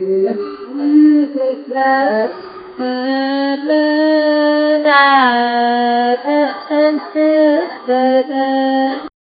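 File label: pakistani womens laughing; cultural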